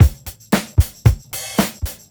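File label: BEAT right, Roots Disco; USB Soundscan vol.32; AKAI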